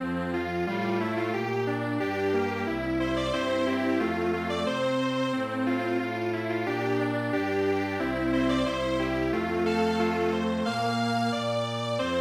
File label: Strings Quartet; Holdiridia